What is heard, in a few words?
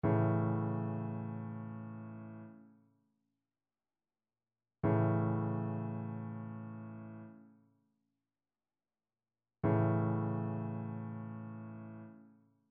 trichord; trichord identification